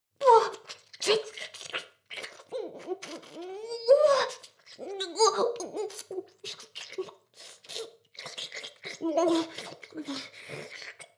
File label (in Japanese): ダウナー風俗 フェラ